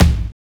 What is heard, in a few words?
Tom A#; samples